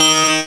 HI BELL

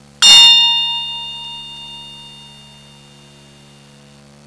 HI SWISS, Bells

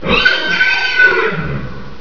SCREAM, Animals

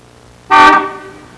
car horn; Transportation

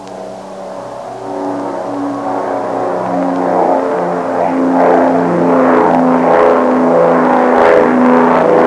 CESSNA, Transportation

